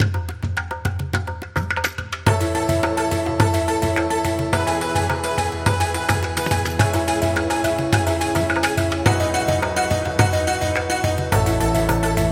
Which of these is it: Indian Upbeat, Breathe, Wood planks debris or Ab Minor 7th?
Indian Upbeat